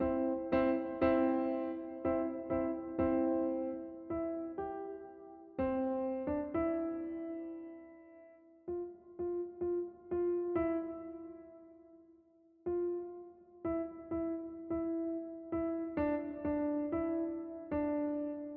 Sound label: Jingle Bells, student recordings